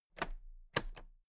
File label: toolbox latches open regular double